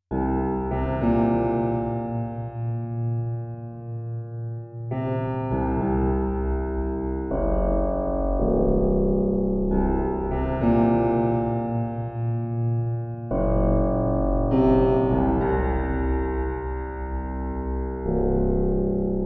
Tension Piano; Call of Duty Black Ops II; PS3; Wii U; Xbox 360

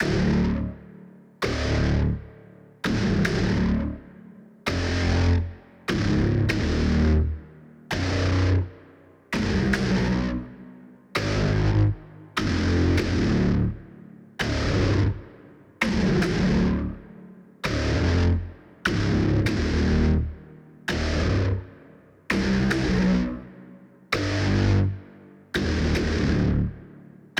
Basses 148

